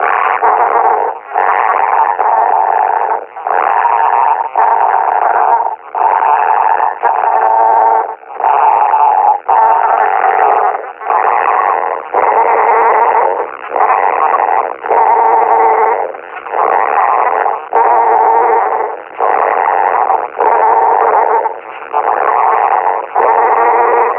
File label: breathing, combine officer; Half Life Alyx